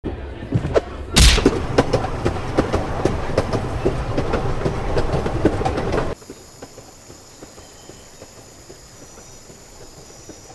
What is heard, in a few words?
Window; Passenger Car